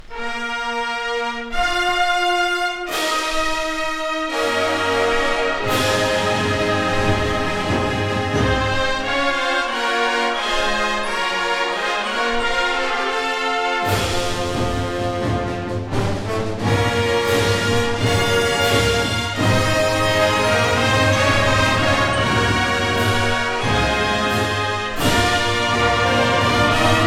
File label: Fanfare, At Half-Time; 1972